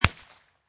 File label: Pistol Liberty 22 Target Pistol Single Shot Exterior At 45'; Pistol Sound Effects; Gun / Pistol: Liberty 22 Target Pistol, Single shot, Exterior, at 45 feet